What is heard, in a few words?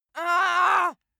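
female angry, screams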